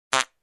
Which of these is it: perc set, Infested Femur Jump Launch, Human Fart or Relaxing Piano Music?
Human Fart